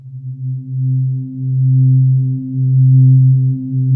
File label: SYNVOICE C2; Music Store - Claudius Diemeter Schwerlos